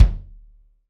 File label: Klik Thud